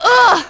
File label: fall; Splashdown; XBOX; Brit